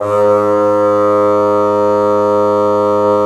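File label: wind bassoon; LA Composer vol. 3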